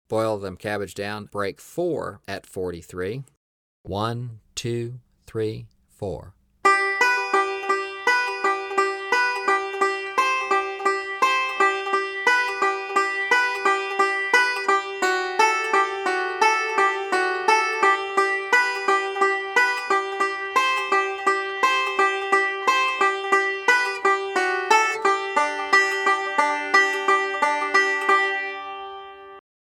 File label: 43 Bpm; Boil Them Cabbage Down Break 2